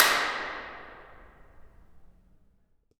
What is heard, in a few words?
impulse